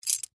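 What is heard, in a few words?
butterfly knife sounds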